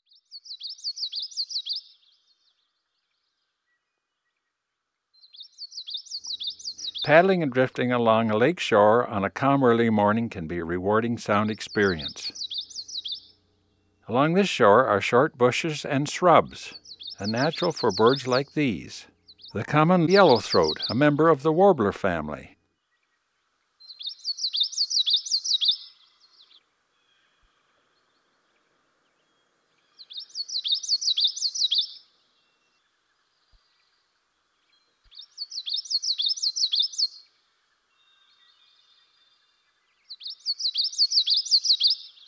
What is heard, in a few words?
common yellowthroat; dan gibsons solitudes